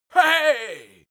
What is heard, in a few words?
male victory triumphant